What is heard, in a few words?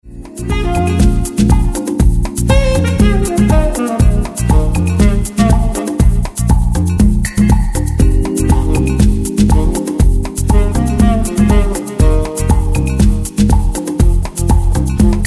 Sax Dub